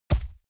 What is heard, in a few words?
horse step grass